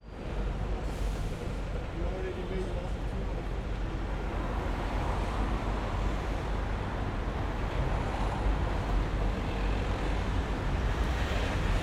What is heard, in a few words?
ambience city london uk whitehall downing street midday